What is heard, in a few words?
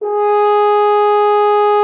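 French horn G#4; Xsample VOL-3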